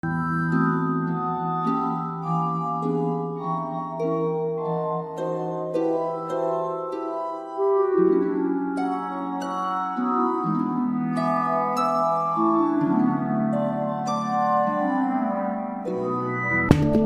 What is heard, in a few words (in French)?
musique, instruments insolites